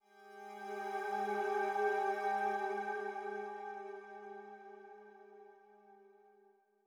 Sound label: Calm Pad; Super Mario Maker 2